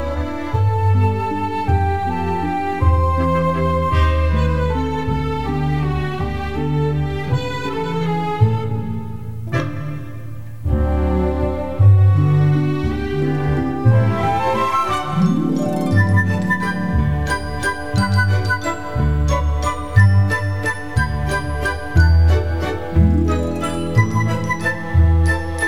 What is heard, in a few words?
Accompaniment